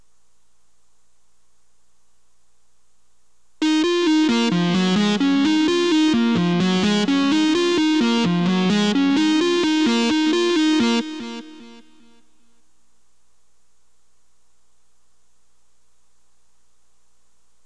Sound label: Delay Guitar